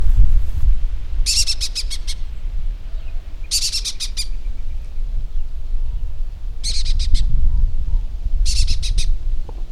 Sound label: Lesser Kestrel